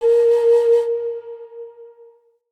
Flute A Sharp Lower; Player Instrument

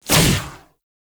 Quick Draw Shot; weapons; Warframe; The New War; Omicrus

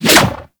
Whoosh, weapons; Warframe; Tenno; Ankyros; Prime Ankyros

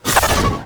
Whoosh, Glaive; Glaive Ghost Catcher; Warframe; Weapons

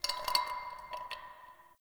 Bar Glass Move; Warframe; Nineteen Ninety Nine; Hub; Hub Pub

